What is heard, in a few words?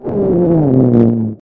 fart; is this the new fart sound effect